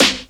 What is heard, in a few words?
SD, Dance Drums; Best Service ProSamples vol.15; AKAI